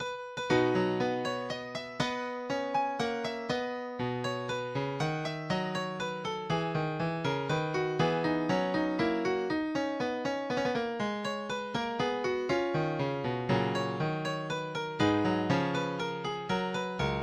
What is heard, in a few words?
Courante